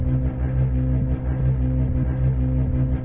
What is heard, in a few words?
loop, Dice